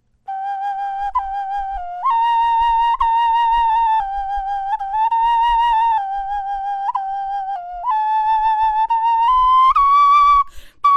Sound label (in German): melodie, knochenfloeten